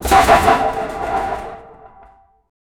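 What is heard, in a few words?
heavy metallic pipeline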